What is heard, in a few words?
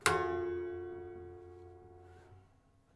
Prepared Piano